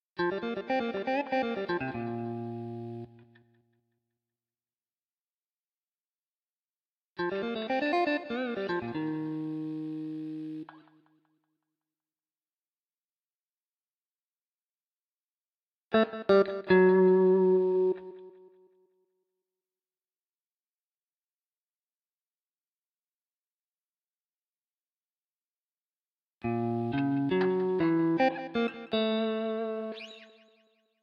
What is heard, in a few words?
jazz guitar